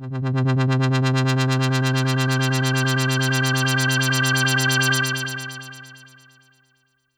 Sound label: Trance, YAMAHA RM1X